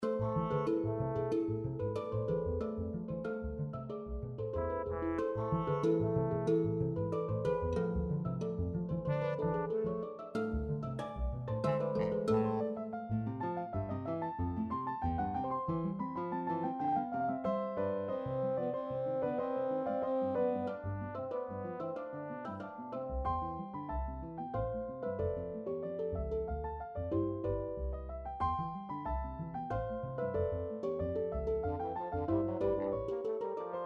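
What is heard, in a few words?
PRESTO, Contrabass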